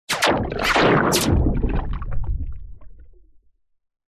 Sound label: warfare bullets x3 impact water med underwater pov; zapsplat